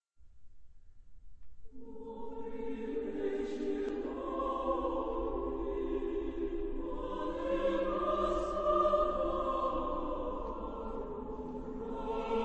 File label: Weihnachtslied aus Russland Russian Christmas